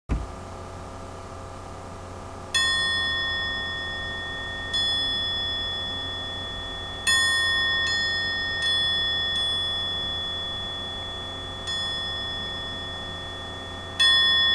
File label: perc